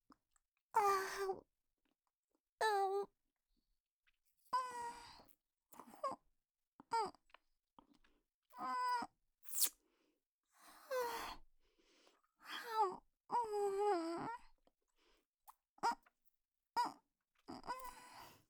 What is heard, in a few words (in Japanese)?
咥えて舐める音